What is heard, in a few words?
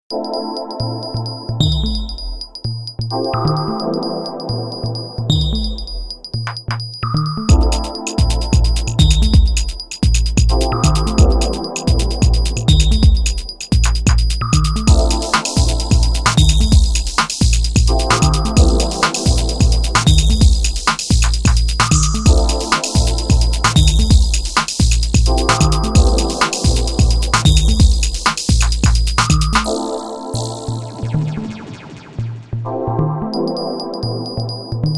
dusty circuit